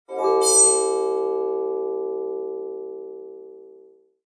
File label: Two Chimes, Two chimes, long decay, FX from human voice; Tech